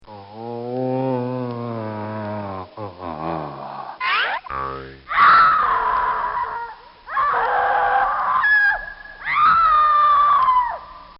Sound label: Comedy Effect Comic Surprise; Funny Sounds